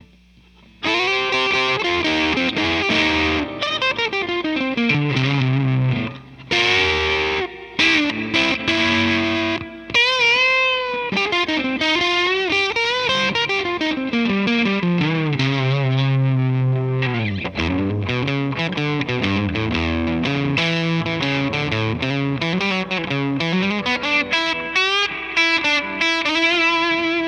tele distort; Silkyn Tube Amp

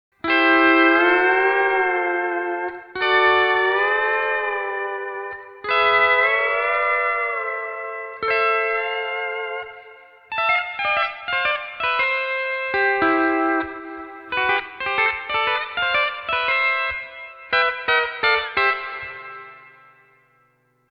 E9th Bar Bend

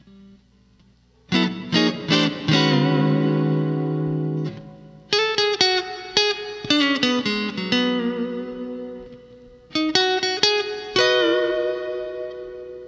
Lead Guitar Only, Tele Bigsby

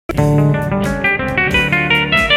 Lick, Telecasters; Nashville

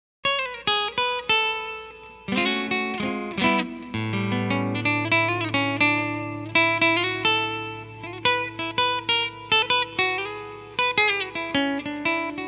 nylon electric; Silkyn Tube Amp

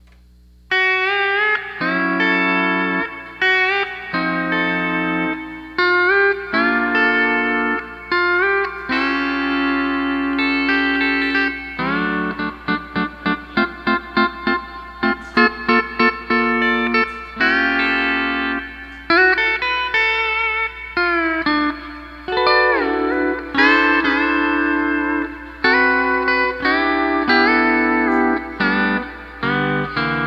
Night Life E9th

